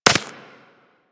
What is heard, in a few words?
Laser Impact Generic; Surface